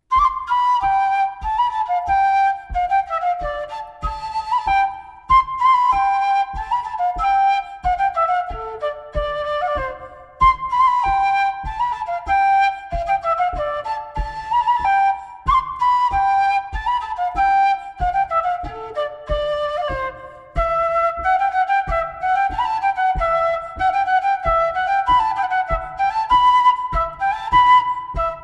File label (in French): Vieil air d Auvergne